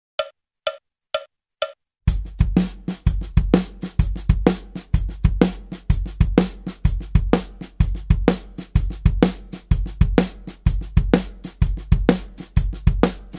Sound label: Blues Shuffle 126 bpm, Guitar; Guitar Aerobics Disc 2